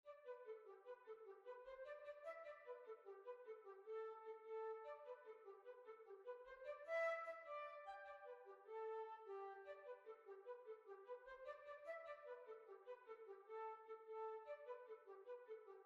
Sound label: Jig; Oscar Woods Jig